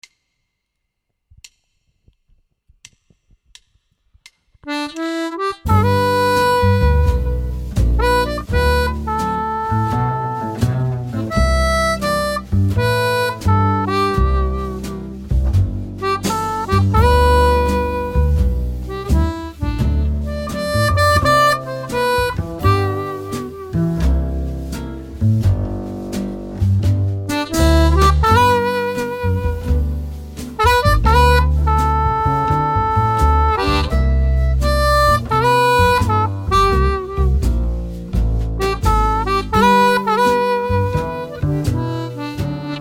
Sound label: harmonica